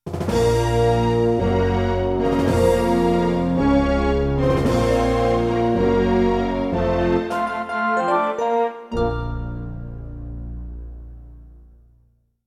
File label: Death Theme - Standard 1 (Humerous); King's Quest 2 Remake